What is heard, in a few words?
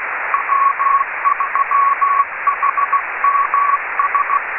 10 GHz rainscatter